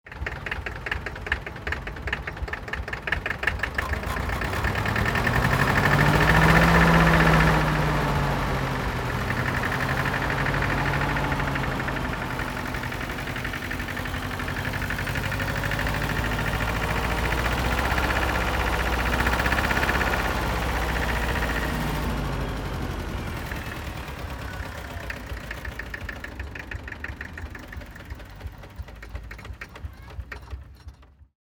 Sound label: tractor driving